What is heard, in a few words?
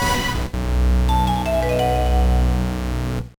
Jingle ~ Item Get!!; Shining Force Resurrection of the Dark Dragon Original Sound